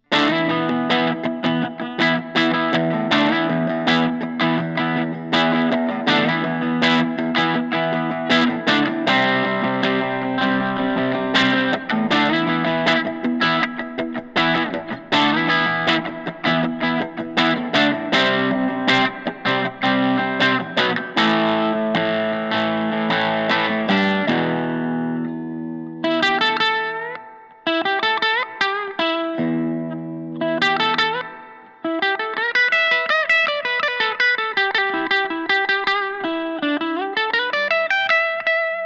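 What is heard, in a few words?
headless guitar; 1993; klein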